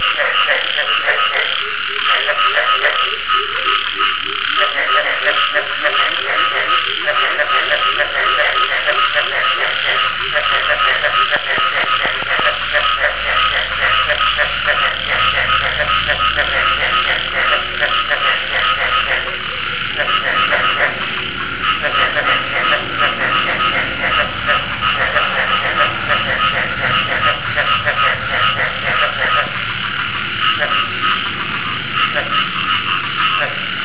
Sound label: frog